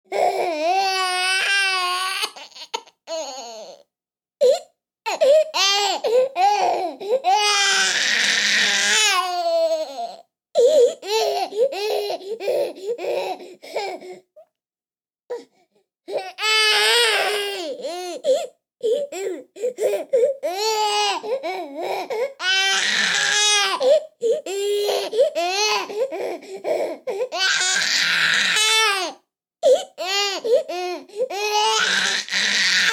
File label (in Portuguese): BEBE CHORANDO; as arvores nos ensinao